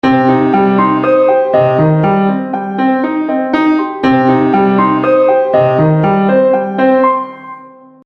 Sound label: Seamless Music Loop Haunting Piano; Musical Sound Effects